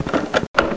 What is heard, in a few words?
typewriter delete; manual typewriter sounds